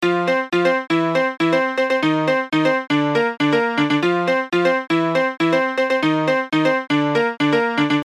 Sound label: piano